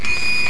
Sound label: ding